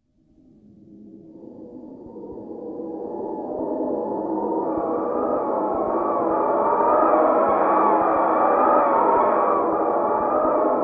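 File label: High Velocity Vortex, ghosts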